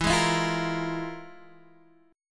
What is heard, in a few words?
F Minor Major 7th Double Flat 5th